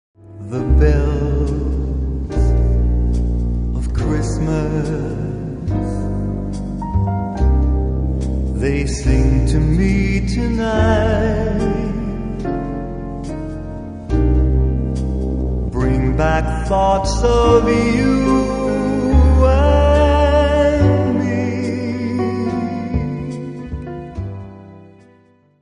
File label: Bells Xmas